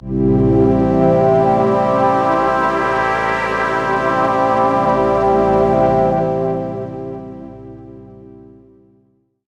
frequency panning static; buzz venus

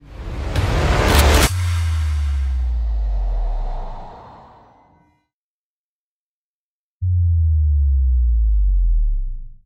movie trailer sweep

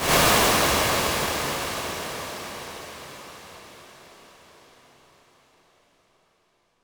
MidiVerb3 sweep; voxengo

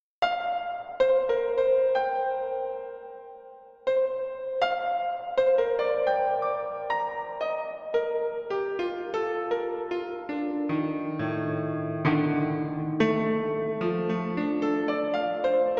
piano thingie; buzz

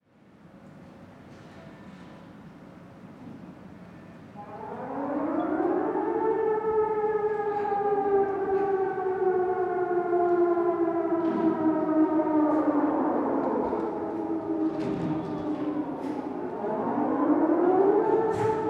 Alarm Sirenes

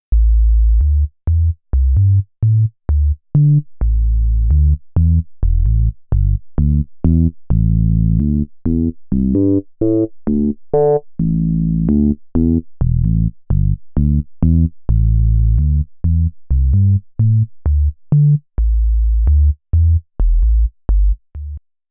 pwm sine; Buzz plugin; TB4005

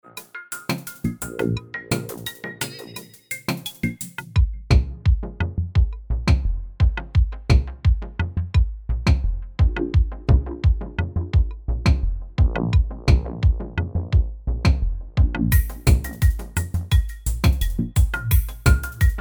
electronica dry; dense fog machine

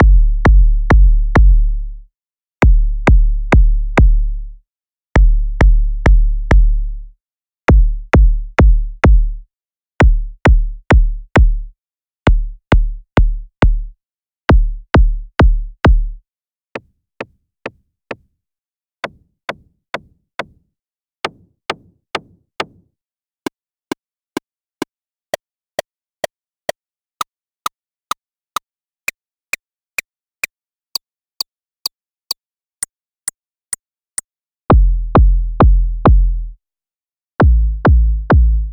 arp drums

